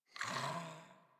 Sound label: Puppy Sleep Idle Snore; Warframe; Kubrow